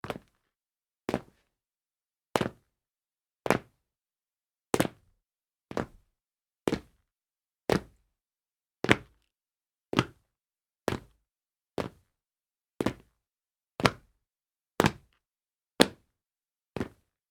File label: jump on tile